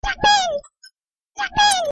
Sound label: Yippee; World Of Goo